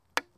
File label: Single Rock Hitting Wood